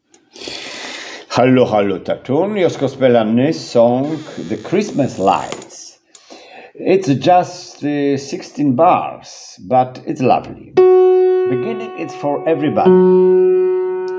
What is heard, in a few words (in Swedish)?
sopran; Christmas light sopran